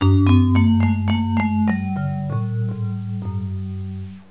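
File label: chord down; instrumental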